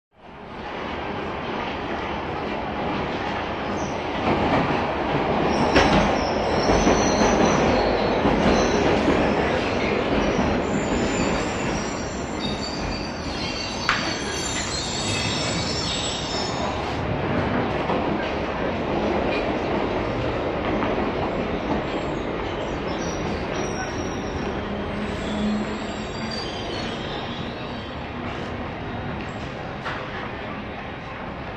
Train Station, Heavy Activity; London Station; Hammersmith Broadway Underground Station London.